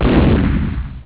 explosn; falcon

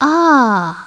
"aaaaaaahhh"; SoftKey

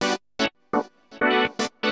synth house 125 A; eJay; Keys

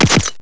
pff-tsh

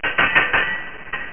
clank

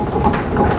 wooden gears; PC Gamer IT CD 41; Daikatana

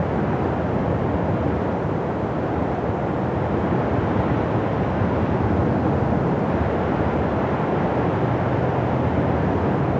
ambloop; DAoC

